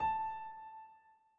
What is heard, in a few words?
Piano (A4)